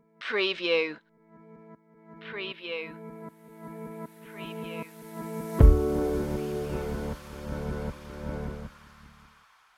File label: stinger; chillout grooves